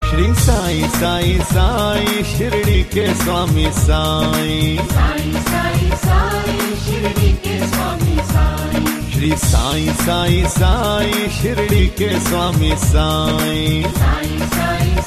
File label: ringtone; shree sai